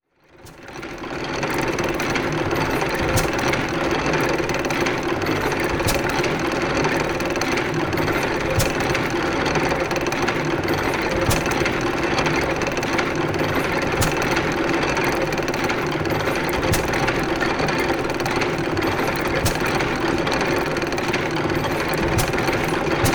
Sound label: Geiser Gas Engine Running; Sound Ideas; 1904